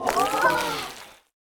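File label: enemy Homunculus Shivering Death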